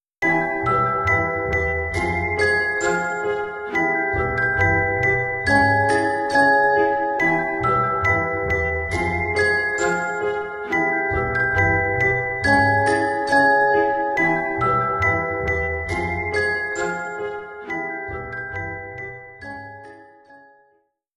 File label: Goals Complete; Buildalot